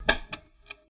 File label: Radio Band Switch